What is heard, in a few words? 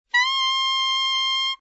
D7 alt, saxophone, tenor